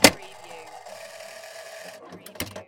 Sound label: Pinball sound, crane clanks into action moves and drops